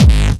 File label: Bass Drum Bwam Am